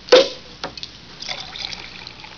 POPCORK

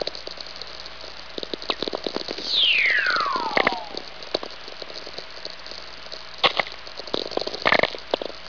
loud whi, VLF

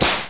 WHIP

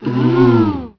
OOOH

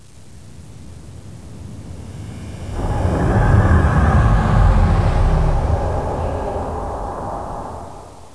CLOAK OFF

